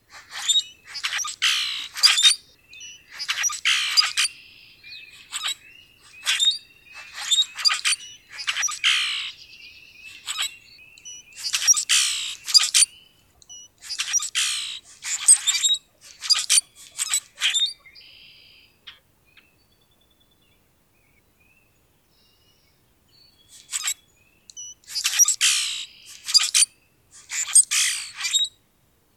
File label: grackle song spreads; conn hill